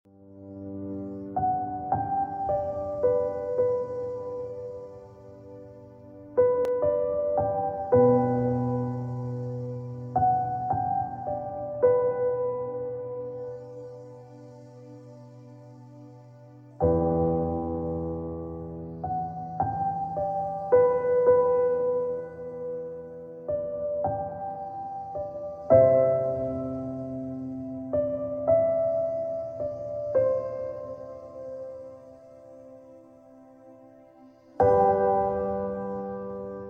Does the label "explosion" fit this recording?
No